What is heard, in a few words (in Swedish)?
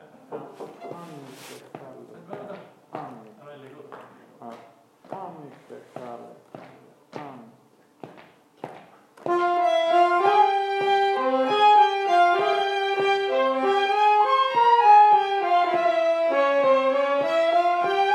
svadbarsko oro C långsam; Taledo